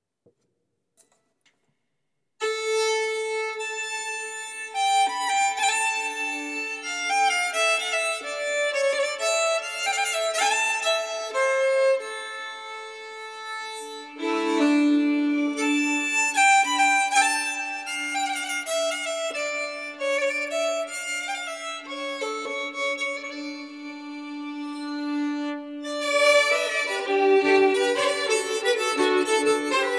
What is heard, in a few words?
Polska; efter Carl Johan Björklund Johan Lind